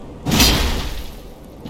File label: greatsword miss; Dark Souls III